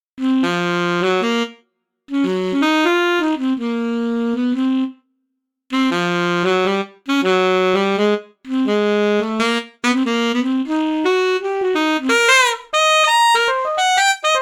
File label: Alto blues riff